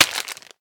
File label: crack